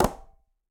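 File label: Quinto A Flam Mute, Conga; Latin Percussion; Drums; Drum Hits; Ableton; Factory Packs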